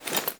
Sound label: Foley Action Default Melee Swipe